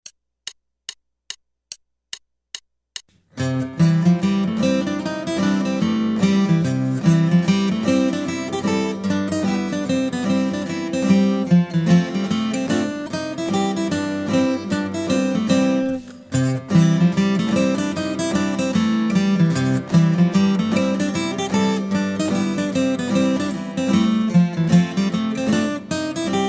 Guitar

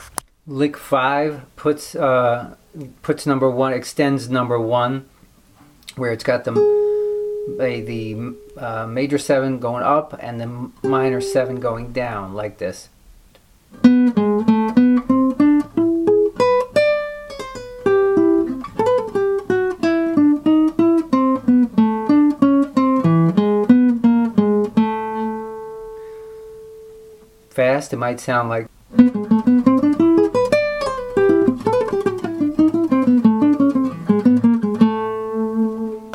jazz licks

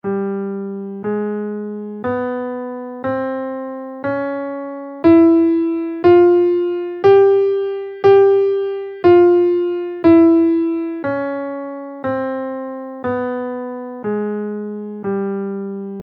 oriental scale in G

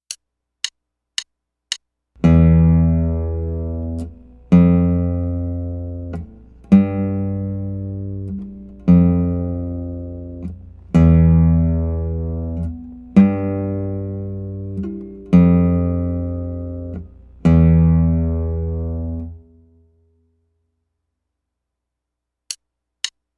E Notes Whole Half Quarter

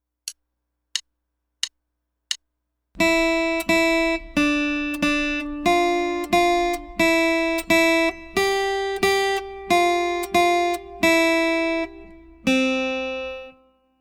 3 4 Study

